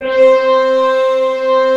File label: PPG, Analog; USB Soundscan vol.49; Analog Fantasies; AKAI